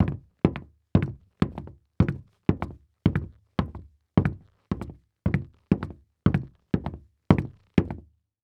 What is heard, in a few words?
Foley Footstep Golf Cleats Walking On Hollow Wood Surface; Foley Footsteps